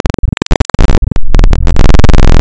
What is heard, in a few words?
Classic Pulse, wavetables; Architecture 32